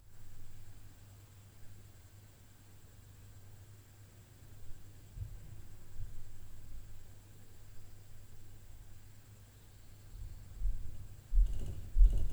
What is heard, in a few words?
LF UPSTAIR NOISE, Night Noise